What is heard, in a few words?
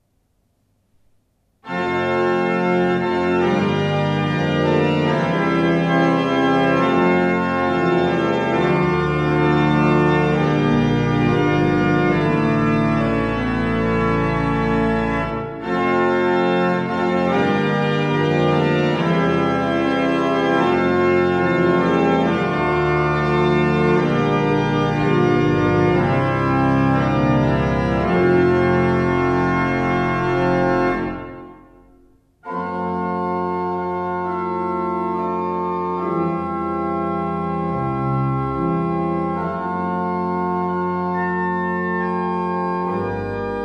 Fanfare; groennaasen